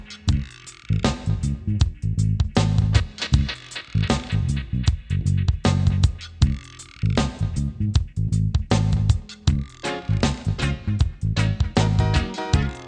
reggae

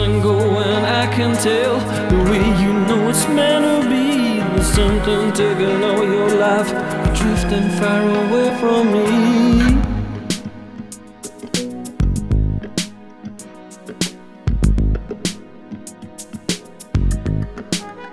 rock

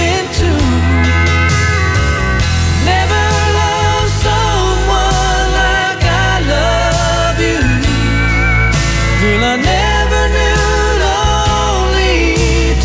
country

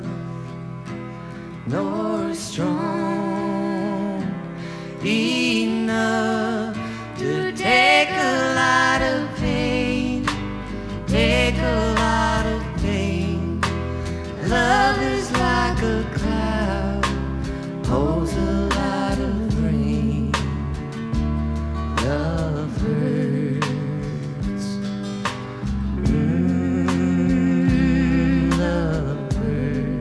country

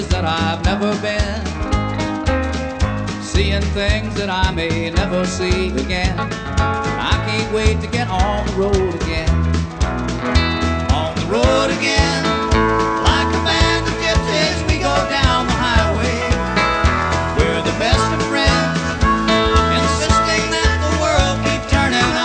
country

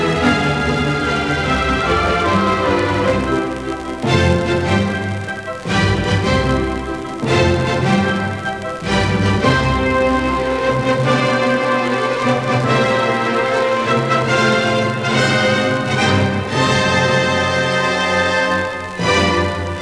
classical

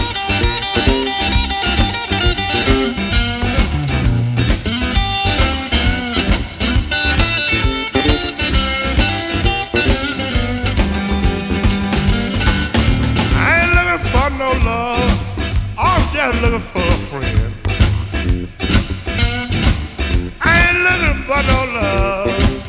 blues

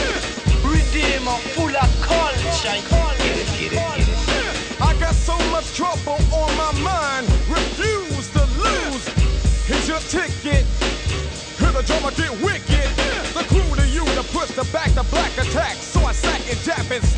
hiphop

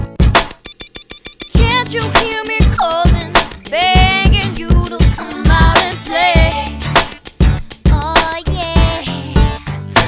pop

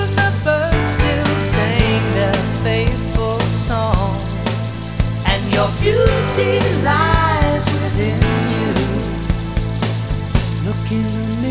rock

